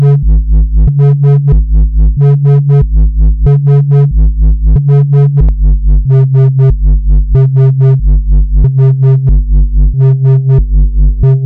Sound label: trance Bass Noise; Dmitrij Soundbox